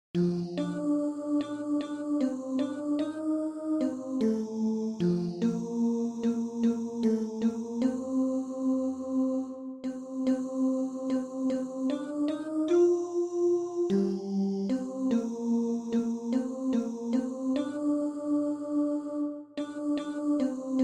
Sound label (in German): Tenor